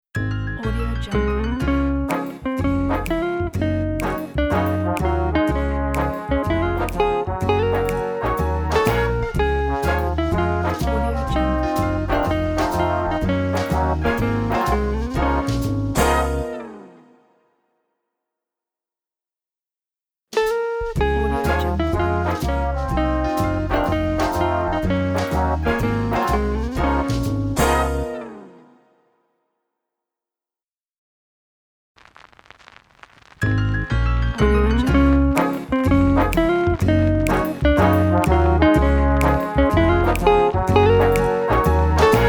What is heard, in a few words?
jazzy auld lang syne logo